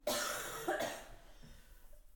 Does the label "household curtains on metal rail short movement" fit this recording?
No